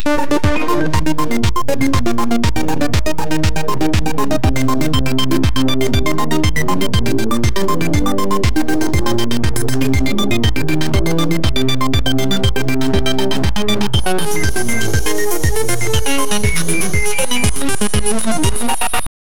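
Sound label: retro 90s computer startup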